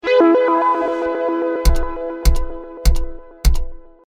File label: jingle